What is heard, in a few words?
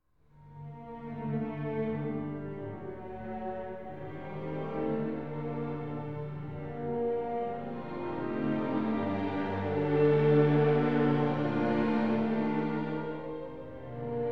classica